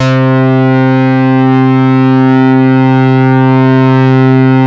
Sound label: leads; Masterbits; Vintage Voltage